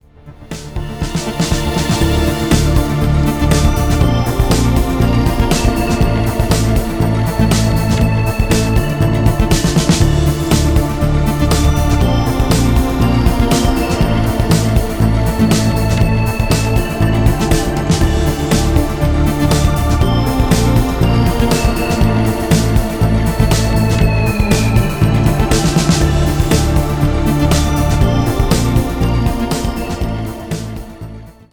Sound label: Instrumental